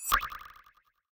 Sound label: appear; battle